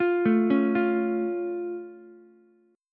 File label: A Augmented A, Ukulele; arpeggiated; JGuitar